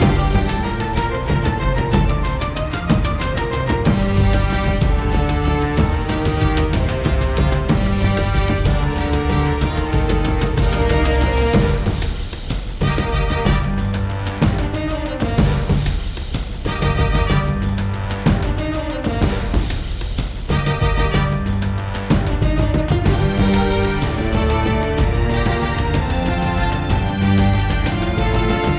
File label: Endgame Victory; tin soldiers alexander the great windows gamerip 2004